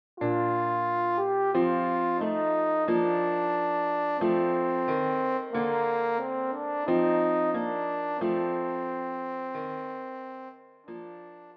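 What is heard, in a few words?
horn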